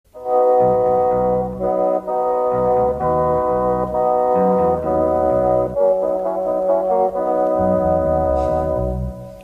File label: Radio 4 Jingle; The Burkiss Way; BBC